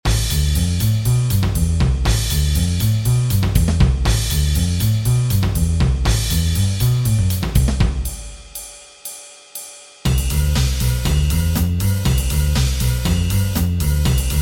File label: drums